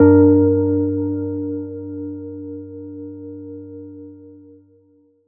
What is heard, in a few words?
G min, Chords; Amaranth; M8; Electronisounds